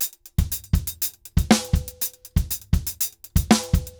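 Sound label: DRUMS R, salsa drums and percussion; Best Service ProSamples vol.14; AKAI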